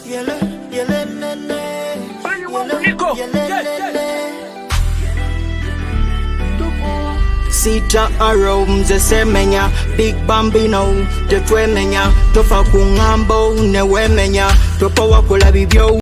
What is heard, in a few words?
Sitar